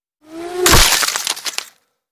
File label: Bullet Impacts Wood I